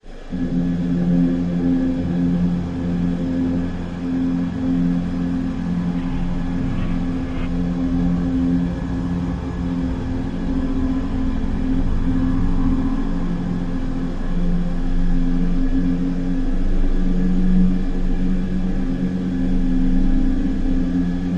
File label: Astrolabe low mechanical drone into distant alarm sounds